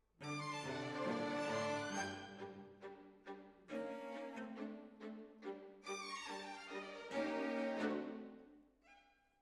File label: Decca, Jazz for piano